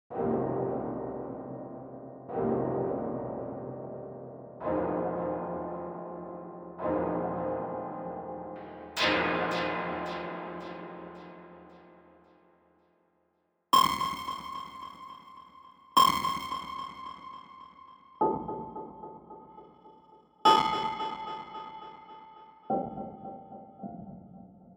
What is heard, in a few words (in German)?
Perkussive